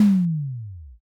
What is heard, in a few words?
impact don